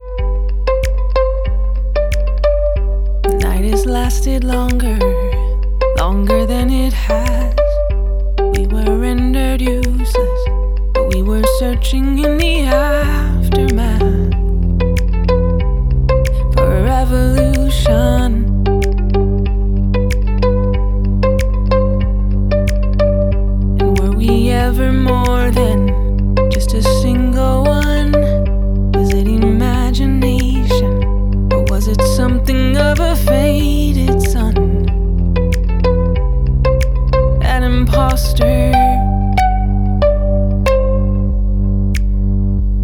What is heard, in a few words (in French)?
piste brute